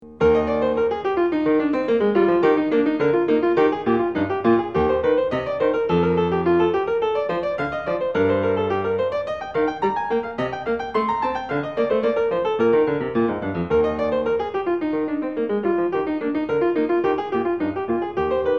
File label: air; bwv 815; French Suite 4; BACH; FRENCH SUITES